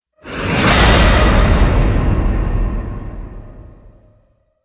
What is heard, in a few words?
Breaker Hit Metal; Ninja Tracks